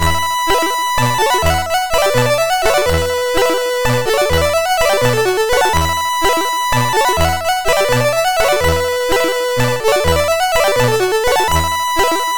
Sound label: String Sound, MOS6581R3